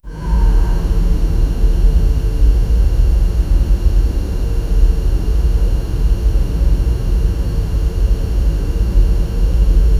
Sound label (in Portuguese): um quarto real e medieval; king